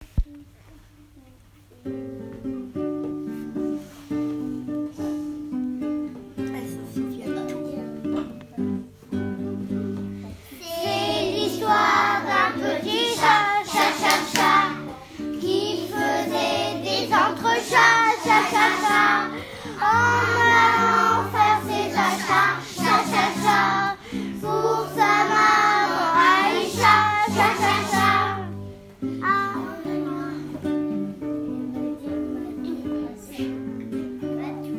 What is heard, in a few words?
Cha cha cha